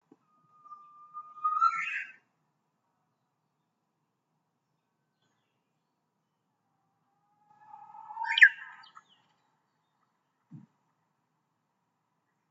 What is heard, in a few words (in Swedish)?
japansk cettia